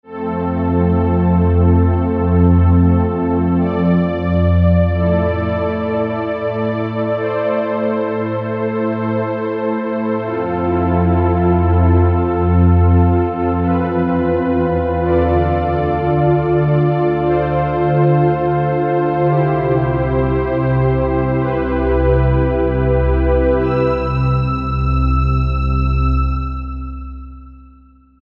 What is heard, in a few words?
Silk Pad; Sonic Reality Modern Songwriter